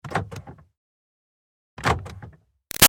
Car Highlander Door Locks; 2005 Toyota Highlander V6; Cars; Vehicles; Big Room Sound

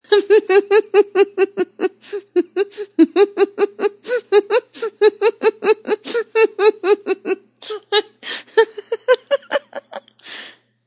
Female Giggle Attack, A female giggles hard.; Laughter; Voice

